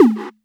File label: LoFi slap drum; Loafa; Ableton Foundation FreePacks; Wicked Kits